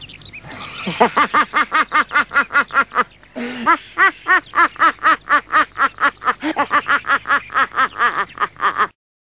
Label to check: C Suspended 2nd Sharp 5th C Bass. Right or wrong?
Wrong